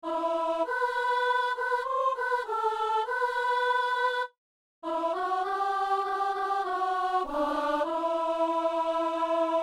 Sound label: soprano